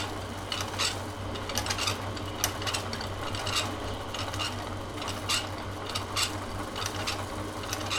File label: Engine - Loop; Survival Game Sounds - Lite Edition